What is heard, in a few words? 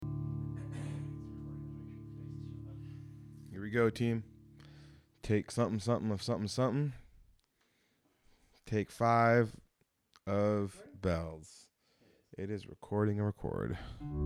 bells